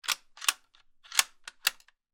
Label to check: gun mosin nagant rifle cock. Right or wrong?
Right